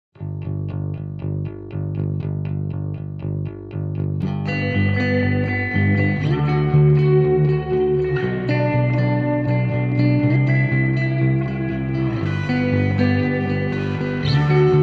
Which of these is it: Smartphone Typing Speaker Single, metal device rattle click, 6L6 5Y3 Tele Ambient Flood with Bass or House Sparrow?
6L6 5Y3 Tele Ambient Flood with Bass